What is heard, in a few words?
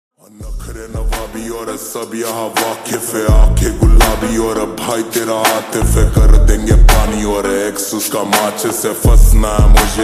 Slowed Reverb Ringtone